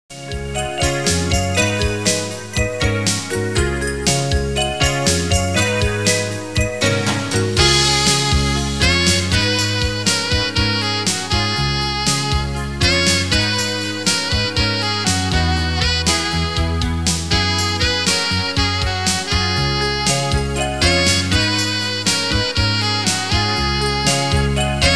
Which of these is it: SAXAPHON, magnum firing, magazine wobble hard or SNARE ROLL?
SAXAPHON